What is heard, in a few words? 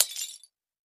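Tile Debris Hit Hammer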